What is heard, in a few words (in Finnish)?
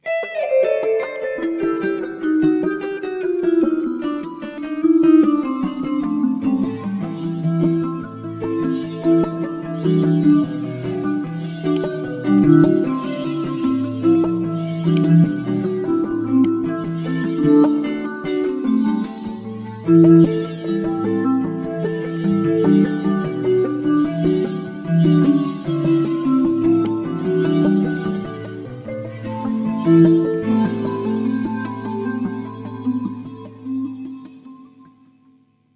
kitarasyna; guitarsynth